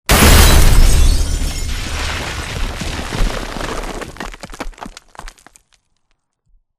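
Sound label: explosion close debris; corporate; Titanfall 2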